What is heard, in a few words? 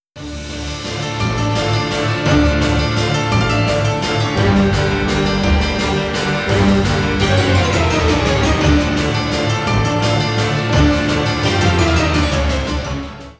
Demented Waltz